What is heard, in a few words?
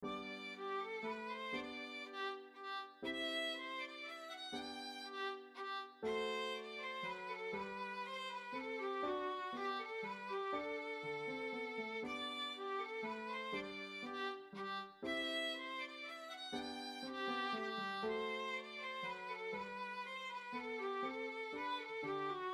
violin